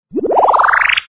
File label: heal up